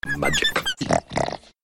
magic

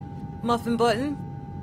button; muffin

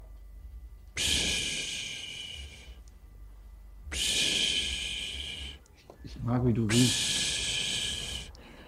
psshhhort

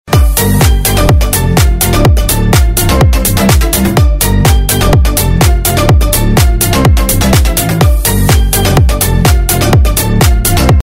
crab song alert sound

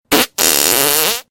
fart

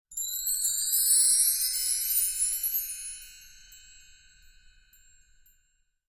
sparkle

